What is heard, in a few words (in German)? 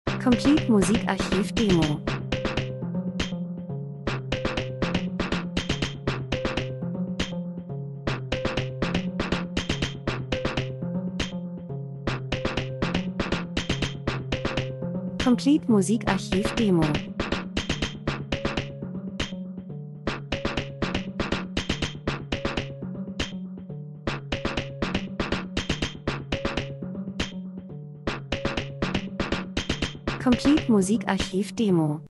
drums, rhythmus; Elektro Rhythmus Spannung Monoton Krimi Mord Verbrechen Nacht Kalt Aufmerksamkeit Spielfilm TV